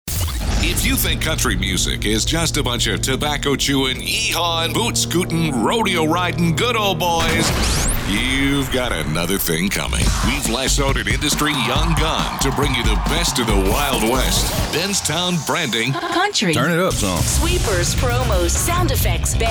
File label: COUNTRY; BENZTOWN